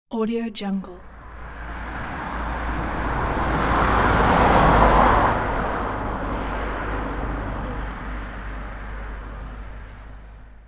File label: mid speed car passing